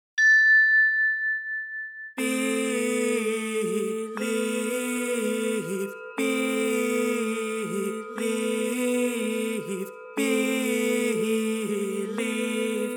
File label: Mantra with Singing Bowls